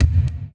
One Shot, Hits

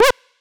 Glitch, One Shots, Glitch FX

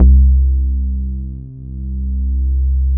BASS MONO

